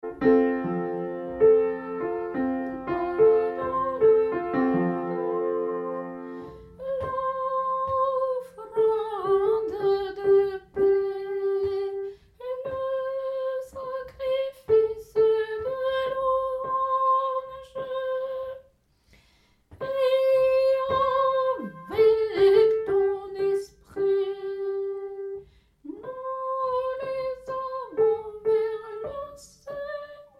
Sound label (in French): Offrande de paix Tenor